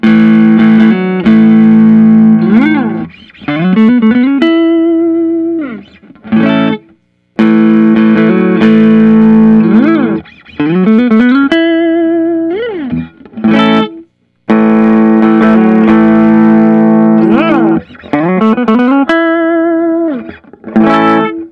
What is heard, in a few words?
nik huber krautster ii; worn copper